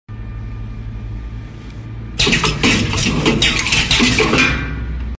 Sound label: Amazing Machine SMS Ringtone